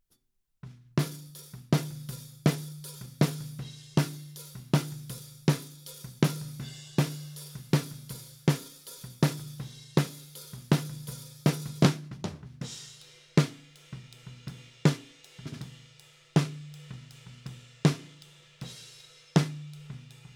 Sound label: Snare Top, 160 BPM